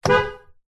vehicles suv horn short honk internal garage nissan patrol